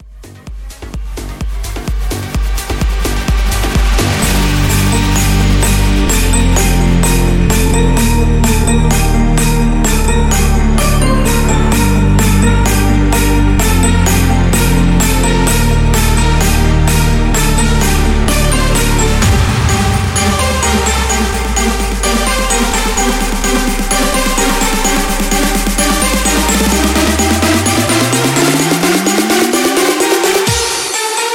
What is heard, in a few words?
Big Room Edit 128; Carol Of The Bells